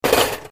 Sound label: cutlery general drop drawer